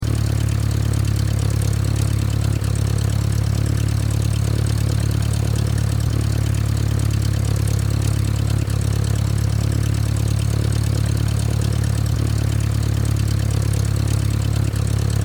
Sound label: Airplane Cessna Idle Loop; A Cessna propeller airplane motor idling, seamlessly looping sound; Airplane Sound Effects